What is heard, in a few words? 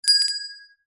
bells small bell shop door ring; zapsplat